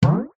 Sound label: cartoon hit impact rise pitch drum like